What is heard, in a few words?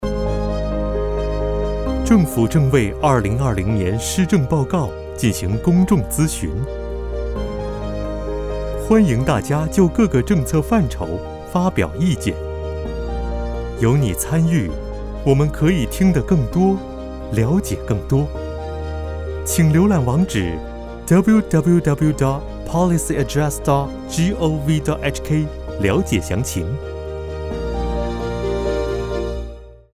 Music